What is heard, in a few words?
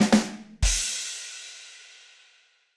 Musical Percussion Drums Ba Dum Tss